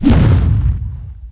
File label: freeze explosion; weapons; dota; crystal maiden